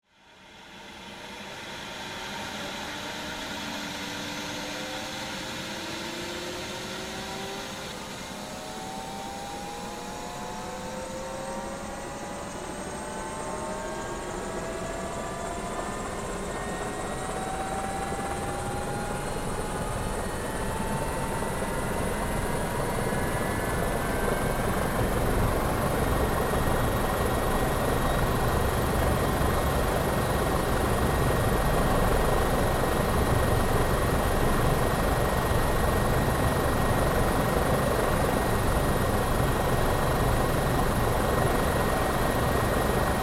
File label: Helicopter Start, Aircraft, Helicopter, Start, Up, Idle, Hh-65, Dolphin; Transportation; Interior, Perspective